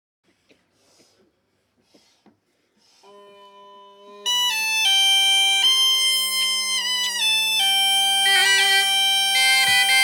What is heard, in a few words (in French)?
bombarde; festival; studio